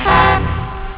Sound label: horn